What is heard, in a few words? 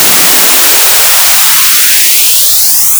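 noise chebyshev highpass, synthesizer; lange-nacht-2009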